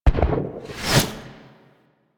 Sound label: Fly In; Archwing To Roll; Warframe; Rail Jack; Archwing Deploy